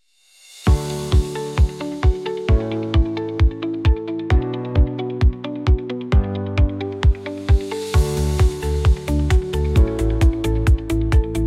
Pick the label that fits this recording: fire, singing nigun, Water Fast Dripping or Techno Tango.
Techno Tango